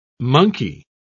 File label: monkey